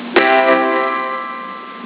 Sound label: piano